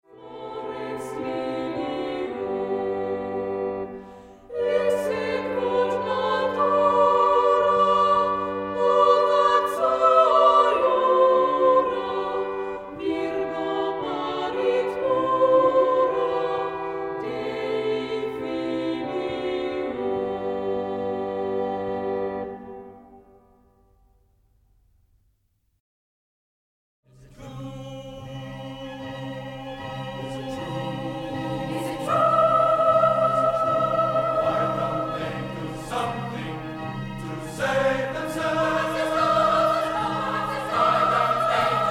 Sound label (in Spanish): el coro